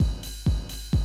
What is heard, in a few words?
130, Classic DrumLoops